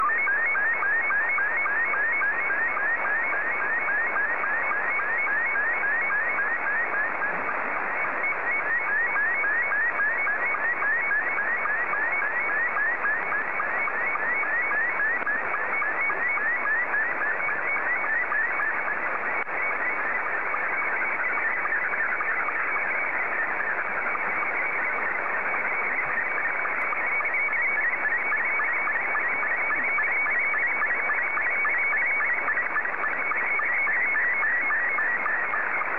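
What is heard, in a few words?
SSTV, Scottie 2; JA3QJQ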